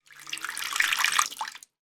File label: household wok fill with water from tap